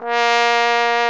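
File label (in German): POSAUNE VIBRATO; Best Service; Alpine Volksmusik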